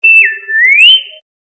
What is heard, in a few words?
Cautious Whistle; Motorola; ringtones; Droid 2 R2 D2